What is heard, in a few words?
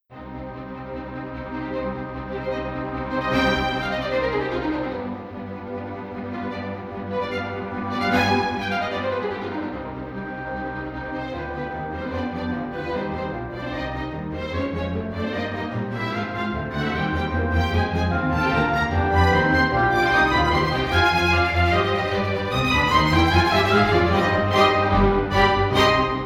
magic flute